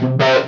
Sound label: Guit; Shogo